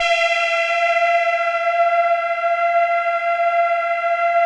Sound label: ATMO PADS; Best Service; XX Large